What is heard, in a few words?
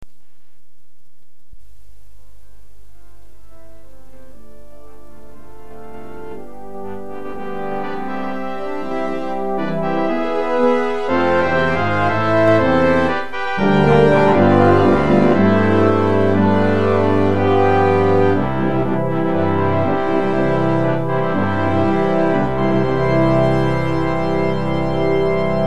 fanfare